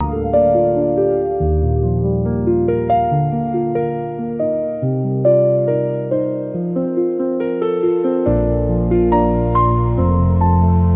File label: loop; bgm